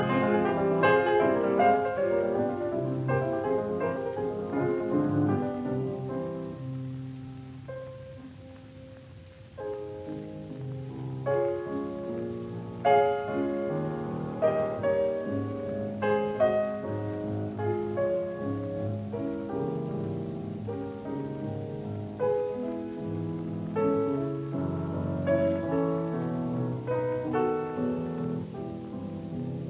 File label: Piano Pieces Intermezzo in F minor Romanze in F major; 6 Piano Pieces Op 118 No 4; No 5; Wilhelm Backhaus